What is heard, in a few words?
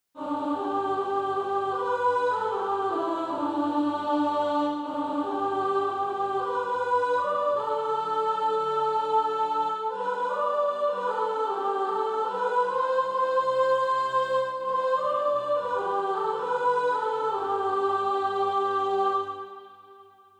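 soprano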